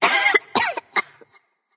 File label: clear throat light; smite; voicepack; hel